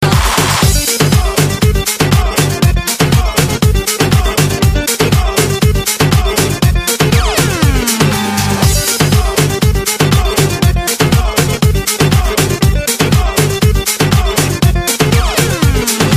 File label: Bulgarian Dance Mix